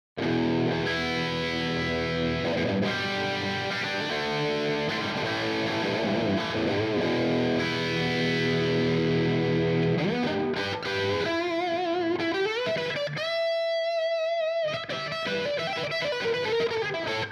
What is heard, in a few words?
Lead; 50w